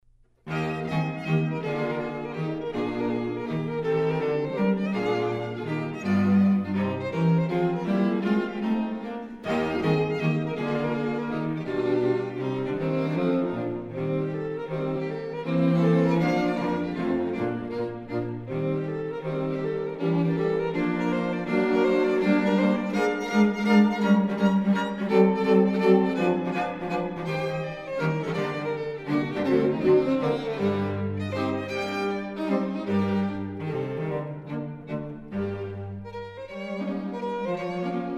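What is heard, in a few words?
string quartet; foote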